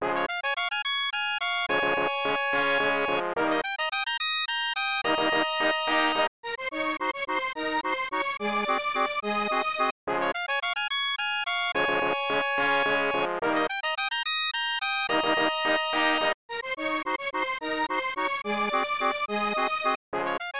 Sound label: Short Victorious Fanfare; bs sousa sentai wappers; snes